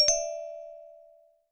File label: Xylo, notifications; Canonical Ubuntu Touch 16.04 OTA-5